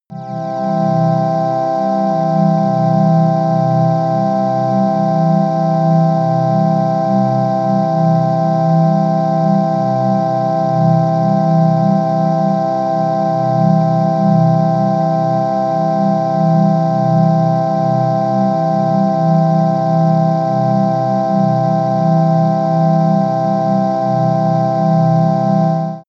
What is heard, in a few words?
organ air